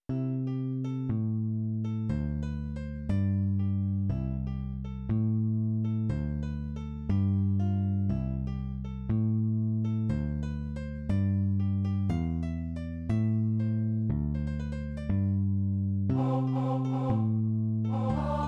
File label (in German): Tenor